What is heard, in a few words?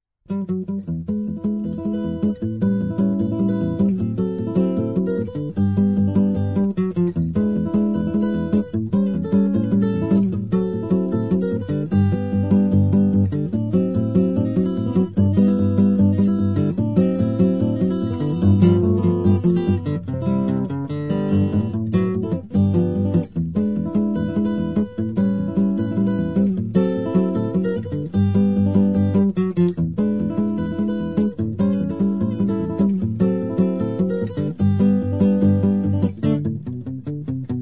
guitar